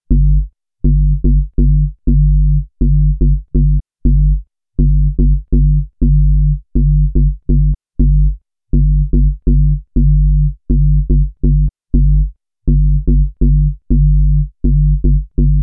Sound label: Bass G